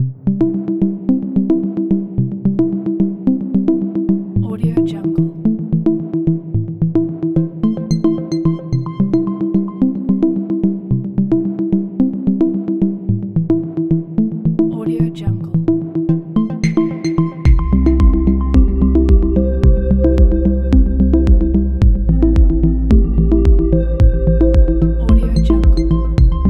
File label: technological ambient background